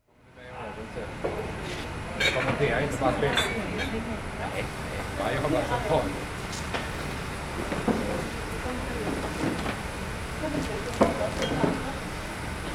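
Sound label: PACKING, GLASS FACTORY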